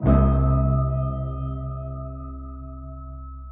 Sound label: clangy, Synths; Notes